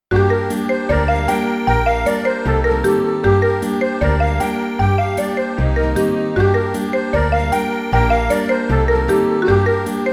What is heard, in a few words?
Penta in Gm 154 Folk